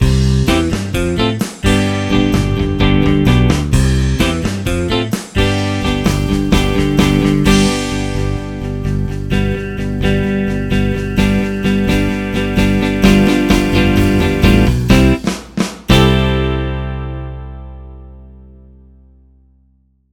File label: Clean